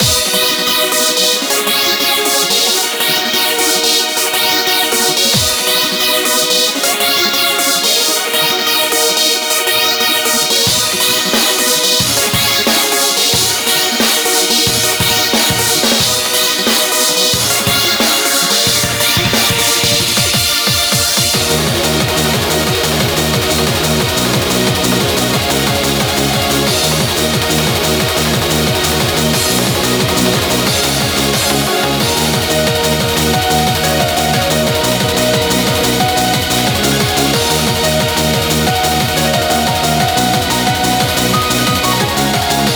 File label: Bgm Reach